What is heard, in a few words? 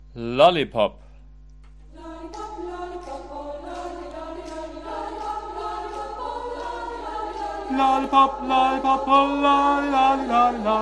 Tenor